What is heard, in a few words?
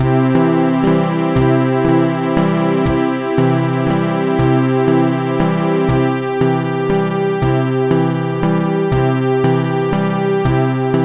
chopin waltz style; SuperJAM!